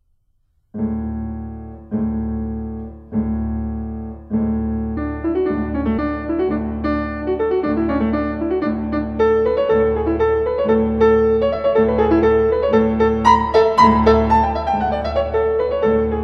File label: piano; Pieces lyriques pour piano; Halling; Edouard GRIEG